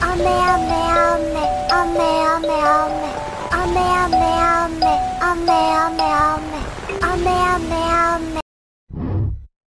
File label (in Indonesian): Nada Dering Anime